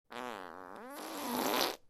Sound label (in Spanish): Presionado